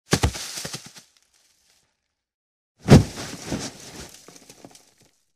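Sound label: falling into leaves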